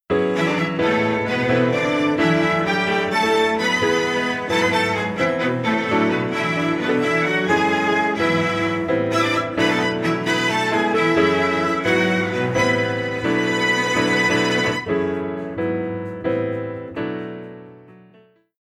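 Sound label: Strings Unison Piano On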